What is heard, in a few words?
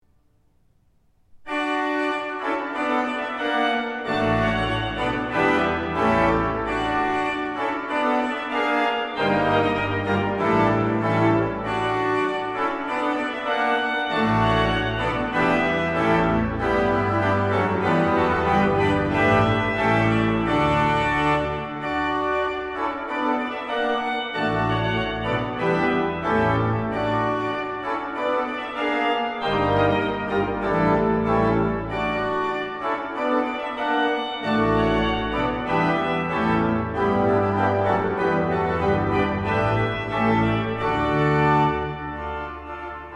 archer fanfare, orgeln; munderkingen